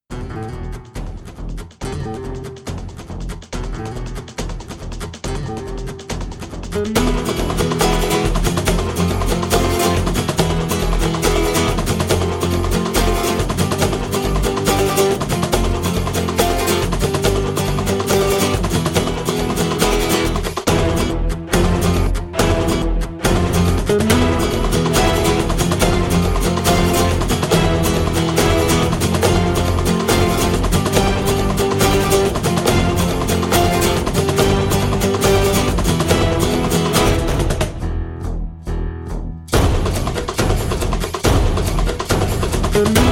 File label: horn